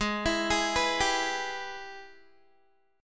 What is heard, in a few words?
Ab Minor Major 7th Sharp 5th, down, Guitar; arpeggiated; AbmM7#5